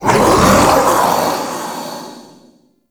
Male Vocal Attack; Enemy; Mockeries; Sub Boss; Mockery

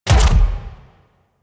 Mech Step; Warframe; Vehicles; NechroMech

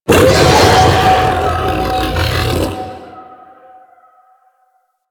Ability Warning Scream A; Enemies; Vocals; Warframe; The New War; Archon; Amar

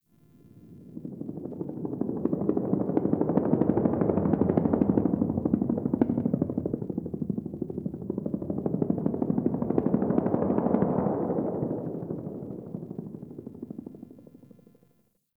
Distant Ambient Helicopter

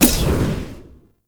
Sticky Bomb Throw, Weapons; Grineer; Veilbreaker

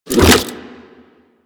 Rifle Reload; DE; Warframe; Weapons; Sentient; Sent Rifle New War

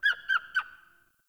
Freed Fawn Call; Encounters; Animal Rescue

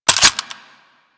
Twin Assault Rifle Reload; Weapons; Grineer; Warframe

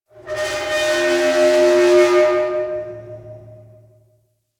Settlement Metal Screech; Warframe; Grineer Settlement

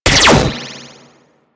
Spectra Fire 3D, weapons

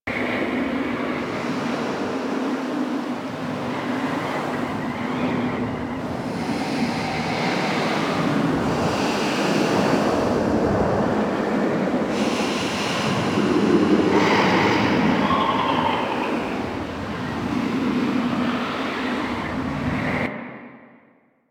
Settlement Ravine Ext Amb

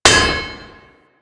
Worker Hammer Impact Metal, weapons; Grn Worker Hammer

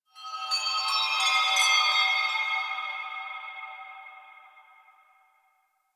Chimes; Warframe; The New War; Narmer Outpost

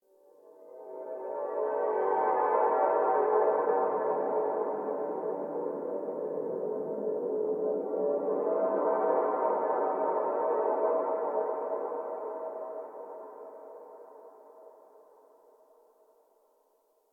Church Bell; Dagath; Warframe; Ambience; Clan Dojo; Dojo Misc

